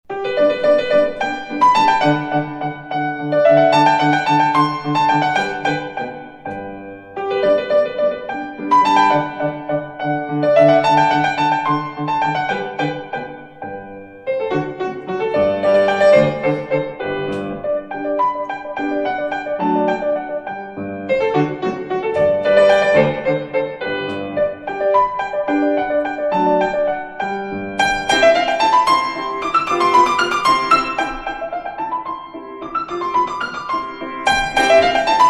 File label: Kosciuszko Waltz